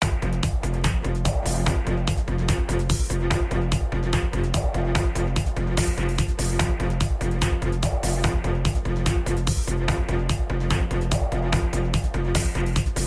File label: Closing Bed; Millionaire Soundtrack; US Clock (2008)